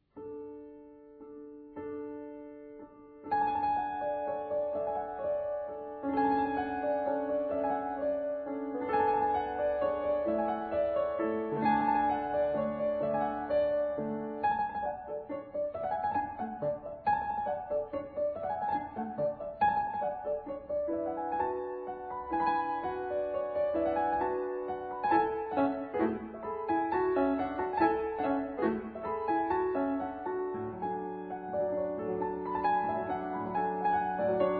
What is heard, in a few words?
Piano; Havard Giboens Draum